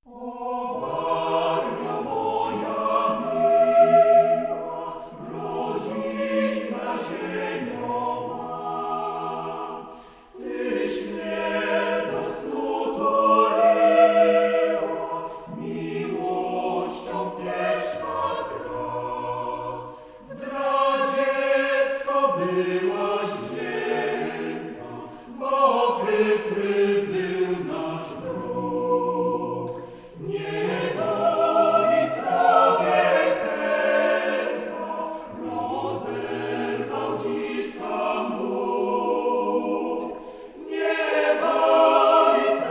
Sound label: hymn